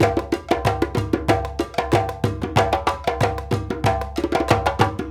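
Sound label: 93 bpm, Guinea; Spectrasonics; Supreme Beats; African Contemporary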